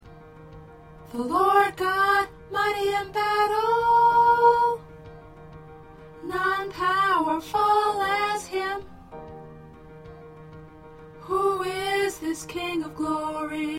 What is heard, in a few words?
P Soprano